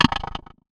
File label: Percussive Hit, contact mic